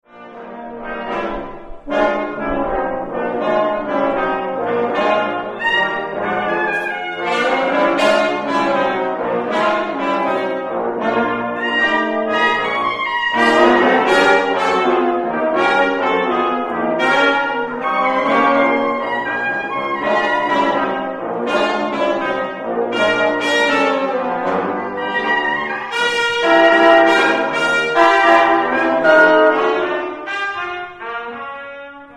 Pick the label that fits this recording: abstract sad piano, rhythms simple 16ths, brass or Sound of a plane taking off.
brass